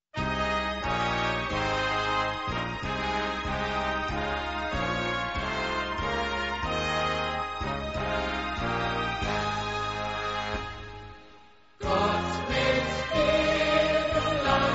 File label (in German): Bayernlied